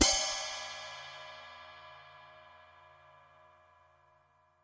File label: real HiHat, REAL LIVE KITS; A Real Freakin Drum Kit